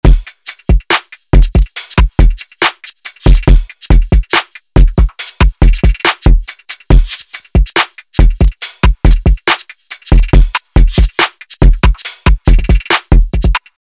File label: drum beat